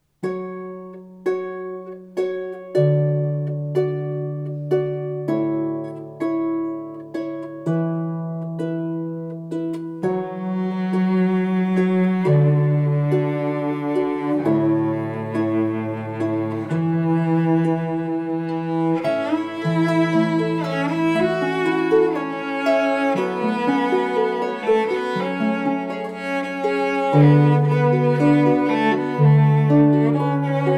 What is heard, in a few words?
cello